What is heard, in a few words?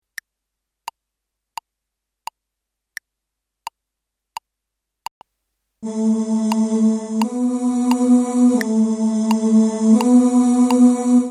Tenors